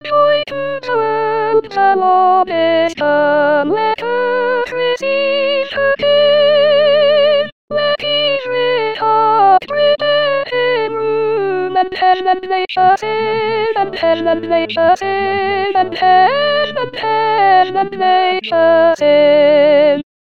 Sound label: Joy To The World SOP